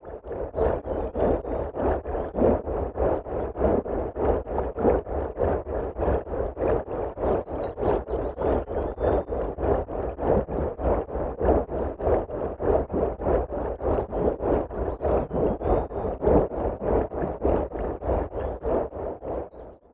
water pulse wave pushin

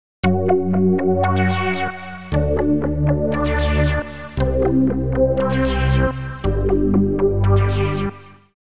the woodpecker seq

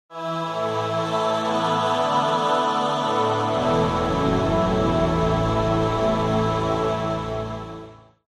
octave vox pad

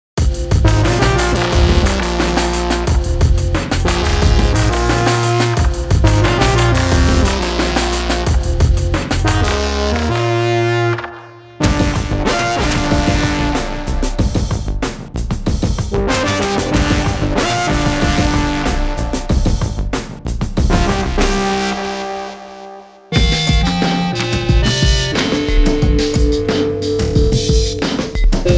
rootbass, leads

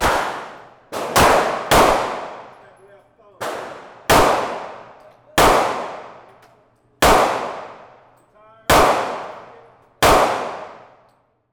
shoot out gun fire; nova

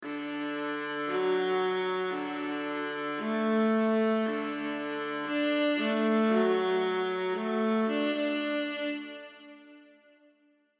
strings viola legat; captains strings

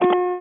beep click

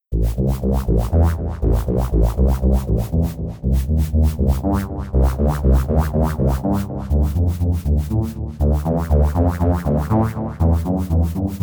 deep voice bass arpeggio

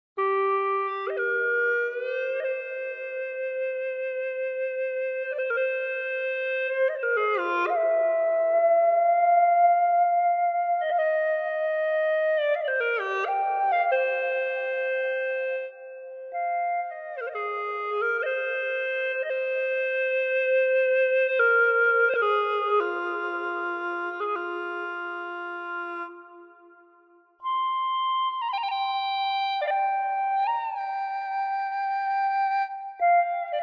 shan bawu

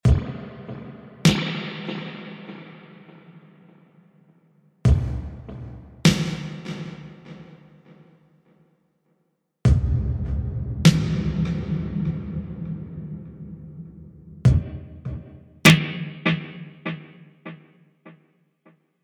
springs plates halls fx

